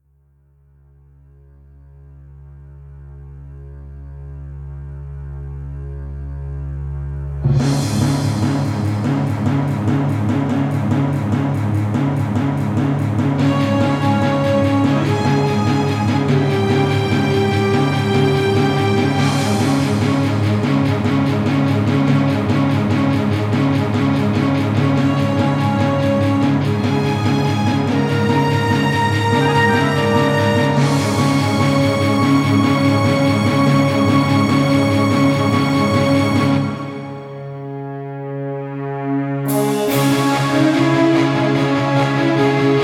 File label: Fanfare; Minstrelix; Chronostrings